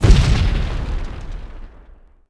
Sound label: Grenade Exlpode; Civ4 warlords